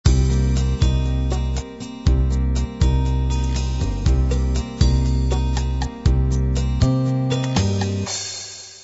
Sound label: unplugged 8beat intro